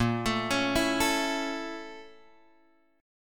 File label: A sharp Minor Major 7th Flat 5th A sharp; A#mM7b5 Chord {6 X 7 6 5 5} arpeggiated